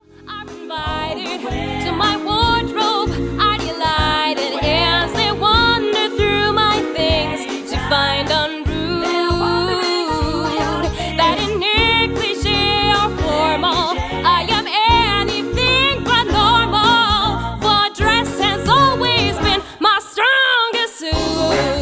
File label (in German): Gesang RockJazz